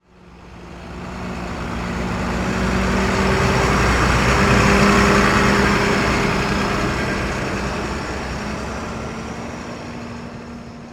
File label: Semi Truck Pass By; Large Vehicles; Blastwave